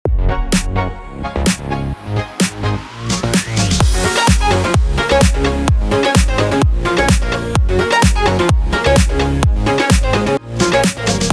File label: BED ARP HOUSE F#maj 128BPM